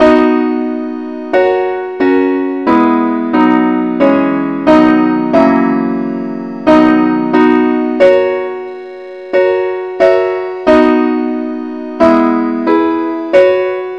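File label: advance Acc keyb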